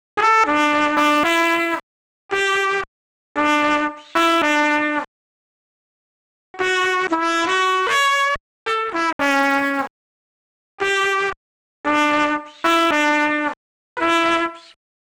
trumpet DRY; 113BPM; Pony Fan Music Remix Stems

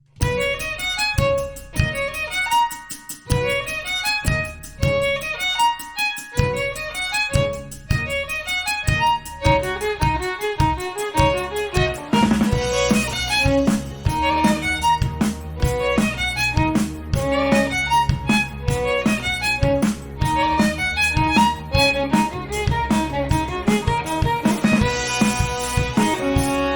Drum & Violin Cover; Pony Music Archive; Twilight Sparkle

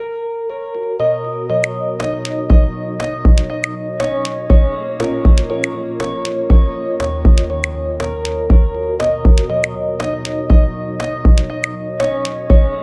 beat

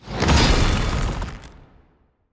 Monster; Zenless Zone Zero

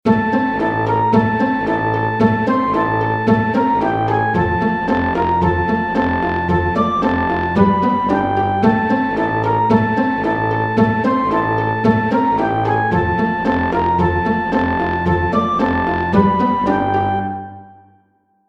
storyflute

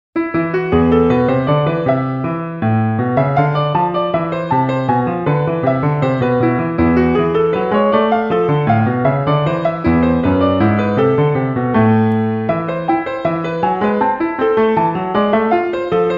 baroque stuff

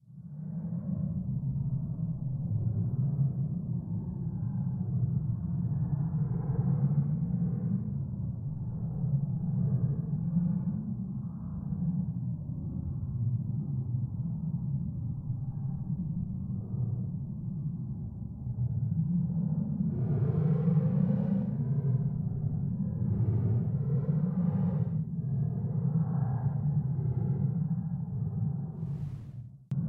sound 30 sec ambient

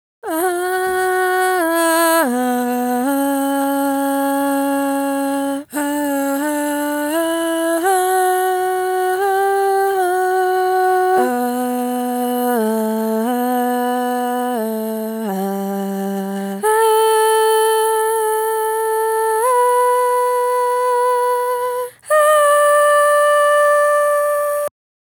harmony (right pan); Death Vox

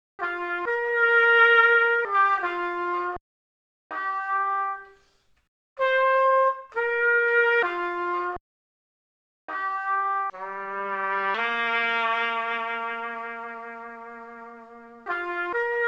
trumpet DRY; 129BPM; Pony Fan Music Remix Stems

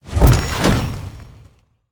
Monster; Zenless Zone Zero

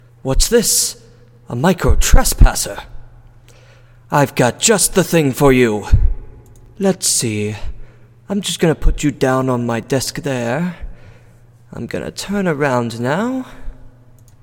farting; thoth; on a micro trespasser

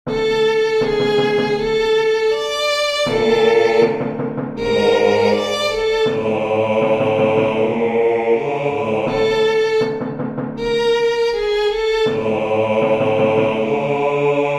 classical pian viol

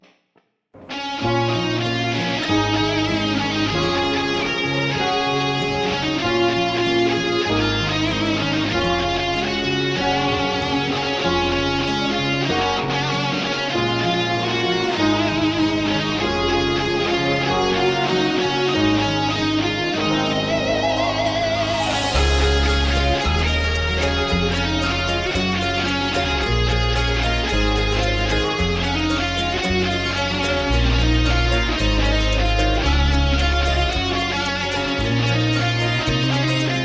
guitar

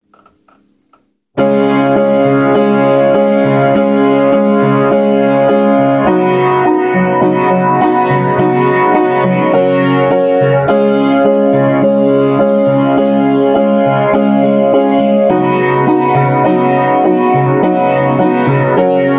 Piano, Goofing off, Some singing, Weird noises; Rage quits

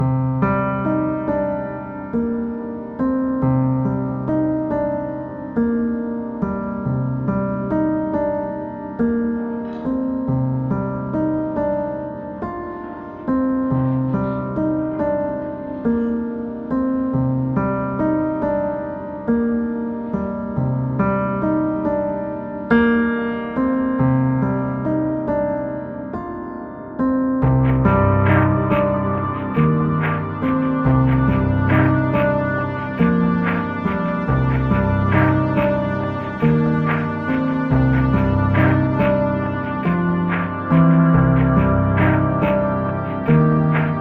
Soft Waves

